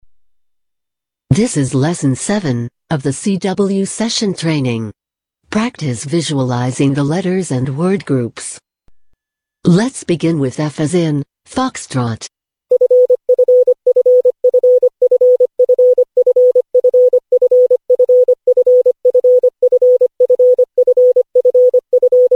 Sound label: cw training